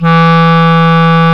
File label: Clarinets; Roland LCDP04; 90 s Sample CDs; Clar Cls